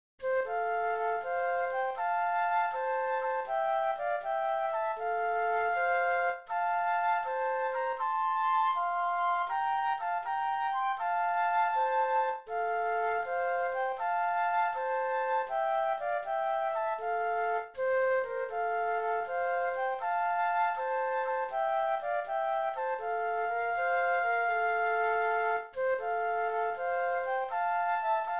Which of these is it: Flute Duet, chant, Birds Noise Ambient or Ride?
Flute Duet